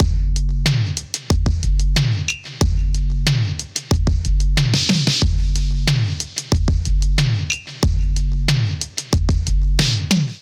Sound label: Sage Reef Kit Melodic 92 bpm, Drums; MIDI Clips; Drive and Glow; Ableton; Factory Packs